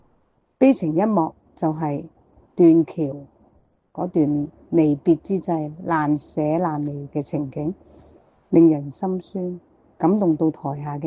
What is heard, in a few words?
audience scene; stage musical 80s 1982